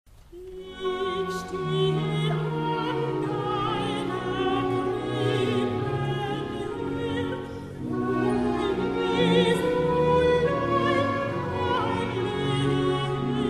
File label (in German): Soli Chor; Bach; Ich steh an deiner Krippen hier